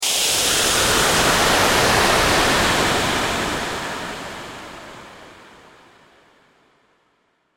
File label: Virtual Dragon Steaming Nostrils; Monster Sound Effects; fantasy